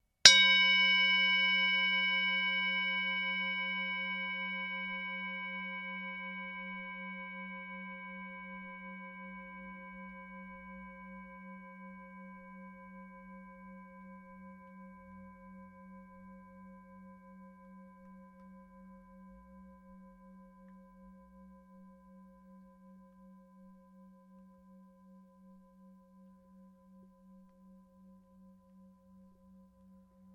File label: Bowl Tibetan Strike, Tibetan brass singing bowls; Sports